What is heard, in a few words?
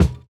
Kick, jungle breaks drum sounds